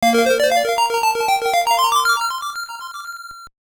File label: Departure Bell